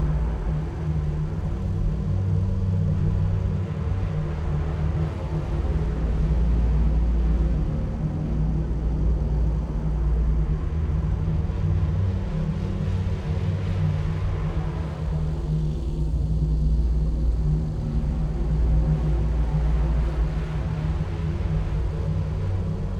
ship construction ship idle; Stellaris; toxoids

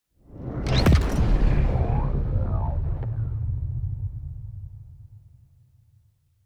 ship move; juggernaut ship; Stellaris; shroud; ships

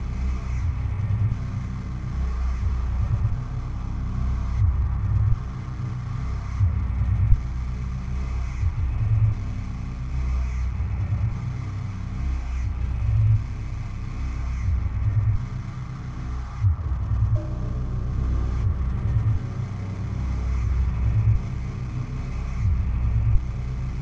matter decompressor distortion; Stellaris; megacorp

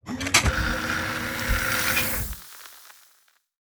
spray foam; rifts; Stellaris; astral planes

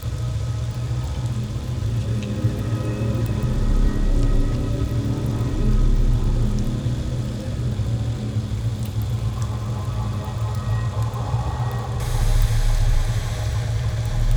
ship corvette idle; Stellaris; toxoids; ships

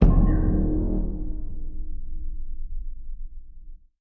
notification ethics generic; Stellaris; paragon; notifications

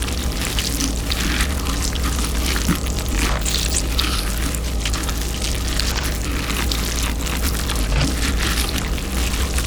ant ship stinger idle; Stellaris; biogenesis

